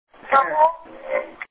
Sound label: radio sweep